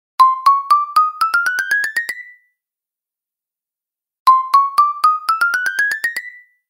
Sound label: galaxy rising stairway